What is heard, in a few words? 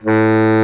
BARI SAX A2; Greytsounds VOL-1